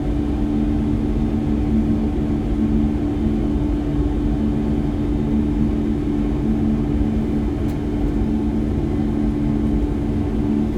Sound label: AMBIENCE Aircraft In Flight Taking Altitude, LOOP, Boeing 737 Airplane Cockpit; Articulated Sounds